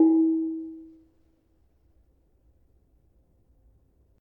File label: Barung 4E, gamelan tuned